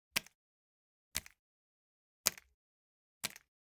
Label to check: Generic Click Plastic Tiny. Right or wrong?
Right